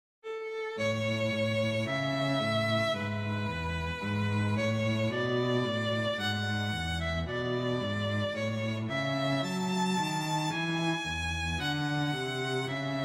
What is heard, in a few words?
Romanza, Andante; Mazas; Duets; Duet 3